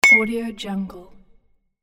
metal baseball bat hit

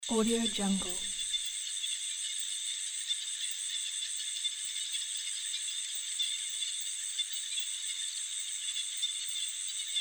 cricket ambience evening frogs wetlands

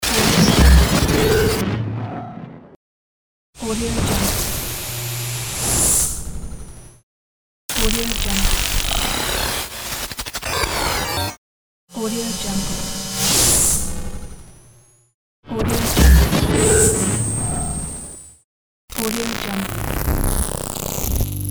metal robot

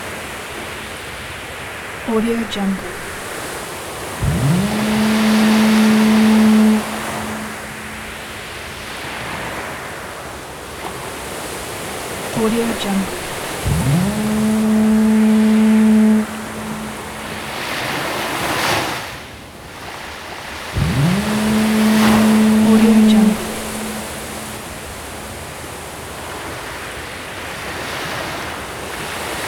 harbor ambience ship horns

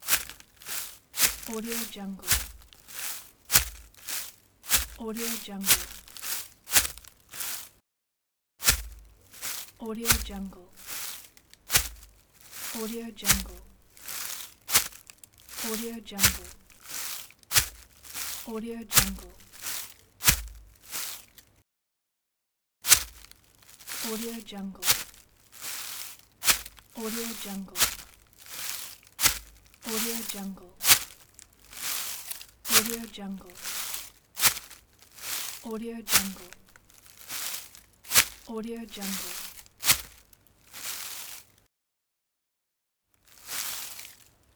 walking in the forest

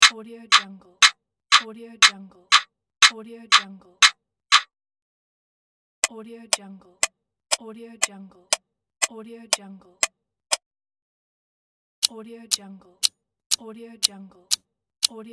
clock timer pack

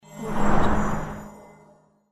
portal

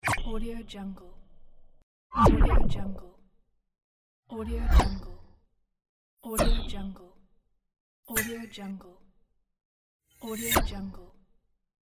woosh high

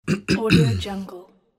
clear throat

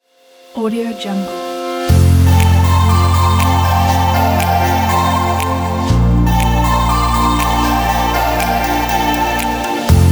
chinese motivation

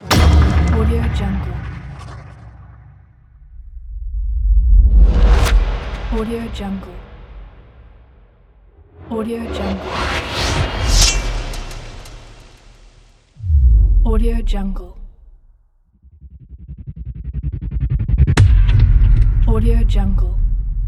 punchy hit pack

